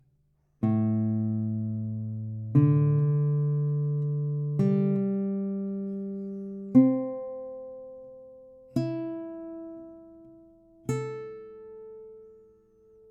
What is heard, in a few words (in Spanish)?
requinto